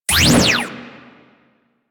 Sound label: Sicarus Prime Fire 2D, weapons; DE; Warframe